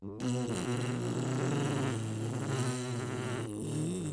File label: Bees; Avatar The Last Airbender